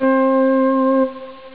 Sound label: cord; Amiga Soundtracker Sample Packs